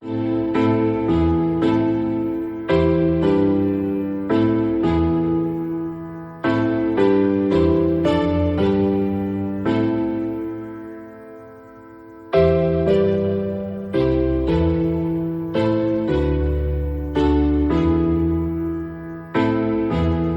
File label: Christmas Classical Music; A Coventry Carol